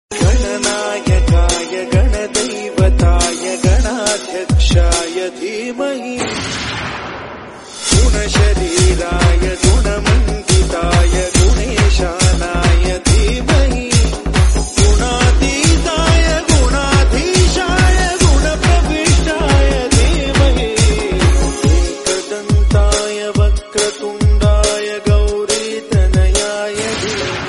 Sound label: Ganesh Ji Bhakti Remix Song Ringtone; ganapathi; Telugu